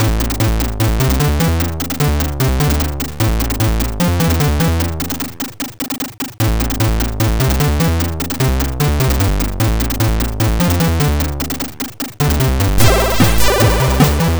c64 in game; rambo iii

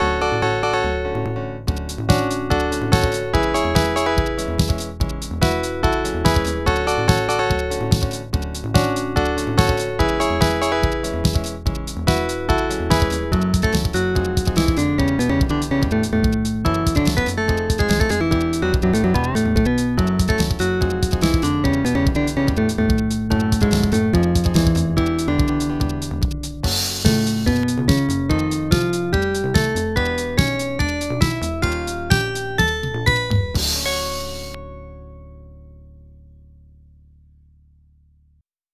arcade name entry; super hang on

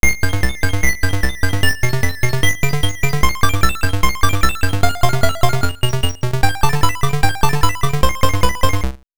atari intro

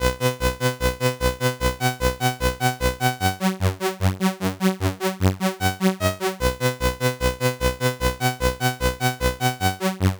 c64 in game; lazy jones

